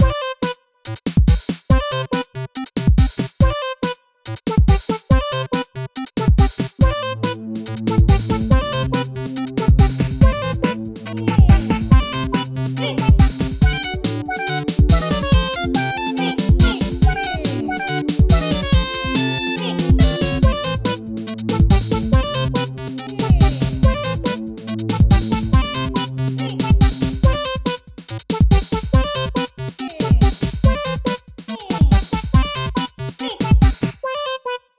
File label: Cat Song, dancing cat song